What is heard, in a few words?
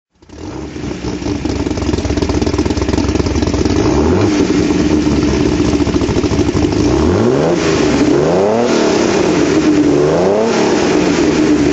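austin healey mkI rally throttle, engine sound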